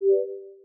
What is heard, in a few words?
Blow